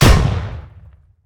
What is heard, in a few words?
missile hit; smite; zhongkui